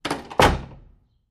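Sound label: Door Close Wood Rattle, push bar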